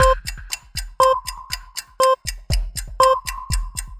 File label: countdown; HotCustard; Beat The Safe; safesecurity